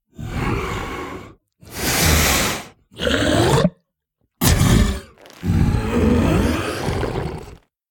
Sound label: Idle Spit; The Town